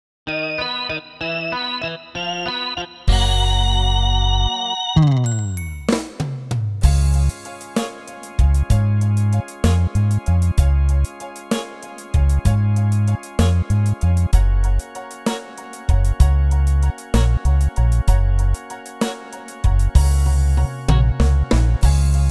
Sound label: Slow Beat